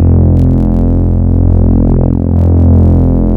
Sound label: Strings; Ableton Live 12 Lite; Vector; Sprites